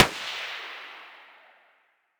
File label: scar muzzle mono far